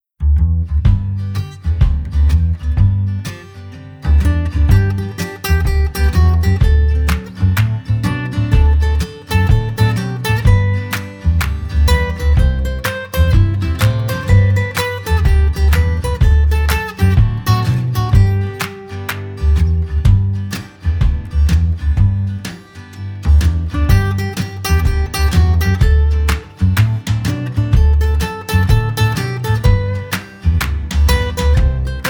If Youre Happy Instrumental

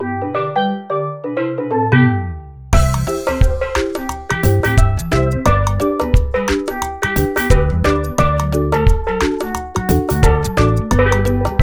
Cool Island Breeze Instrumental

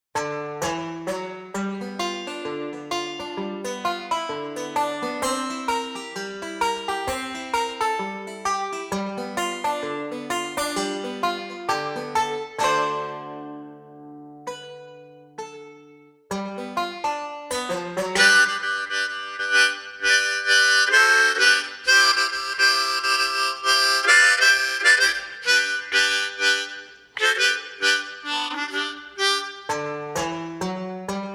Banjo Harmonica Duet

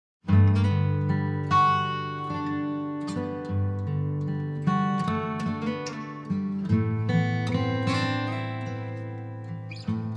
Country Lullaby

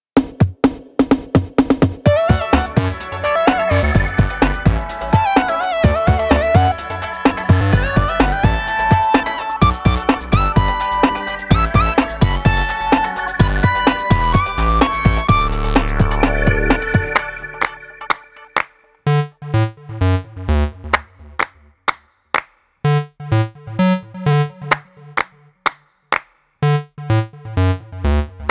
Caterpillar Clap Instrumental

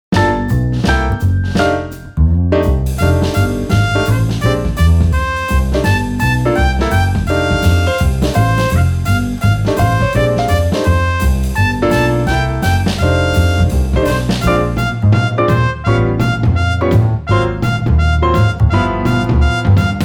Old McDonald Instrumental

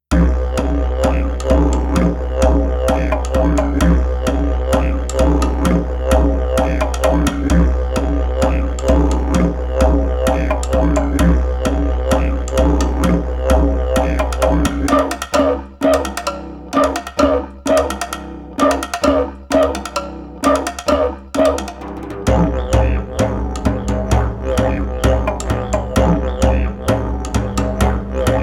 Didgeridoo Instrumental

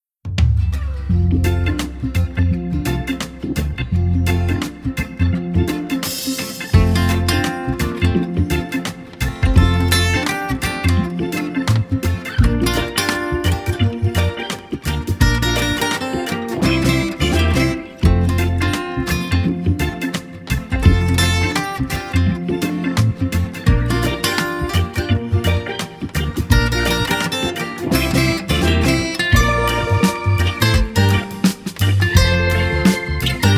Sand Castle Reggae Instrumental

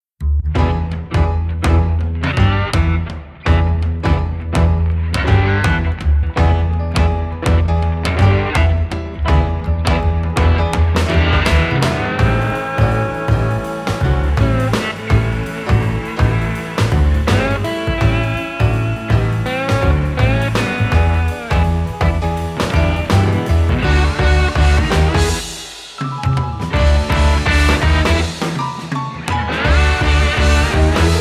Fresco Freestyle Instrumental

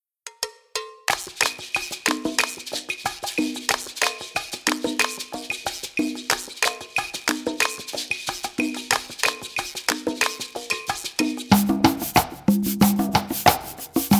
Island Beat Instrumental

